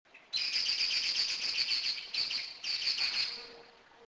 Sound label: tyrannus caudifasciatus, puerto rico; taylori aguas buenas; West Indian